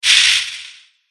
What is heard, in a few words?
scorpion wound